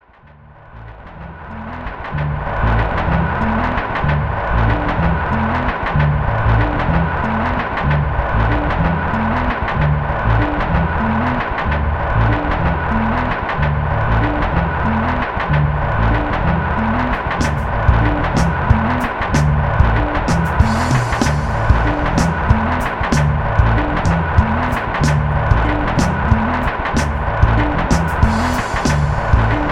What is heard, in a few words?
4 Basses, An Organ, & A Train